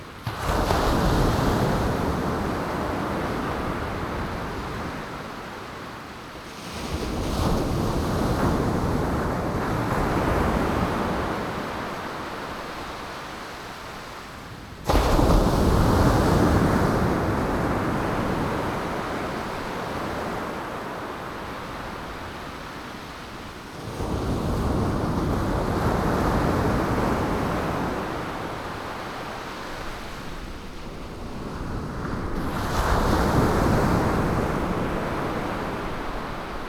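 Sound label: Wave_2, ocean wave sounds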